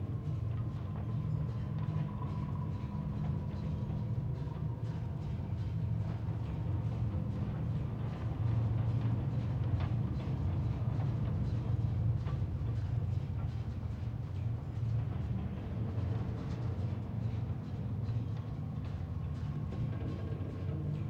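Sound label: ambience hideout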